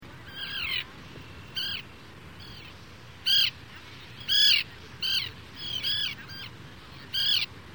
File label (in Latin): sterna hirundo